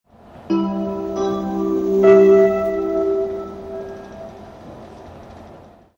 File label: chime; kyusyu